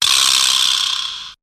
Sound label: vibraslap